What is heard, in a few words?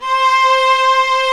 STR, Vlas Sect, Agitato; Roland L-CD702; Vlas Sect1-2